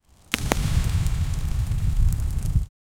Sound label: atmosphere